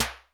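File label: analog snare; 90 sSampleCDs; Crypton - DrumBase5000; ANALOG KITS2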